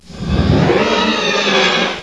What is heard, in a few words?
roar